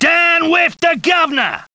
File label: rioter; Caesar III